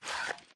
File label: dmr reload mag grab; Titanfall 2